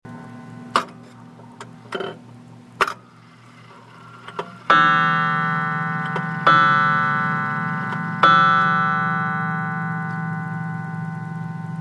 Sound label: coil gong